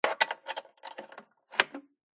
household electric pay as you go meter insert plastic key